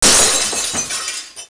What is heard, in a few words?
glass; Virtual Pool Tournament Edition